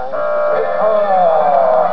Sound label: buzzer